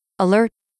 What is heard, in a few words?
alert; gridtracker